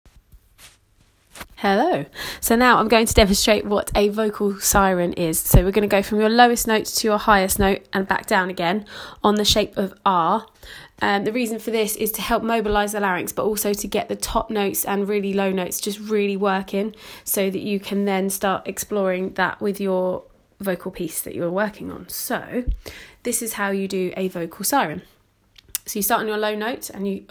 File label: siren